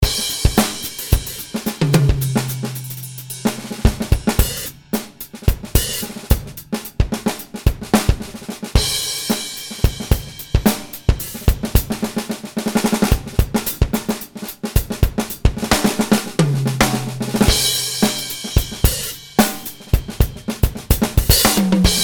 Gretch Purple; BFD3 Preset

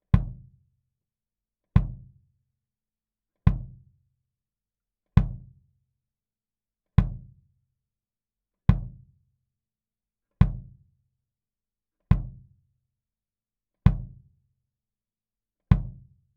Kick Far 15dB Pad

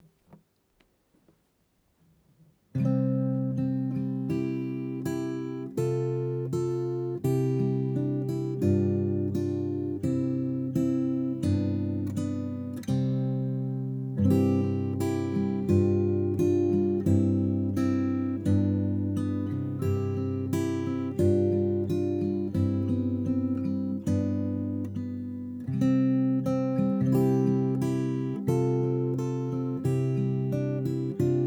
Gt melo

